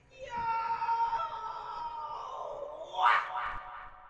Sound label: yaaoouuuwhaaaa!